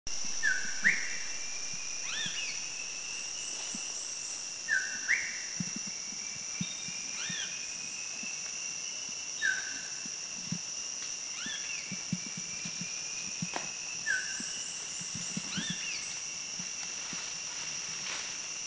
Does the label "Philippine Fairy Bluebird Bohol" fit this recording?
Yes